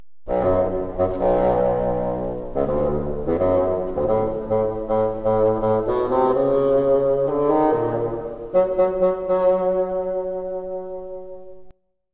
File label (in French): basson; grand-pere